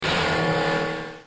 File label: black hole; pokemon reborn e19 2022